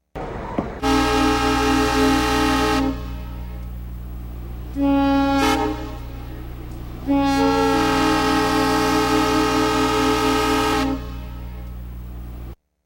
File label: DIESEL HORN; Distant